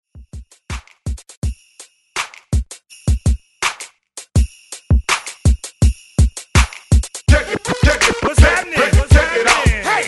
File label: Scratch ReDrum 82 Bpm; DJDOLLS